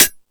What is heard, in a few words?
High Hat, Drum Machines E-MU Pro-Cussion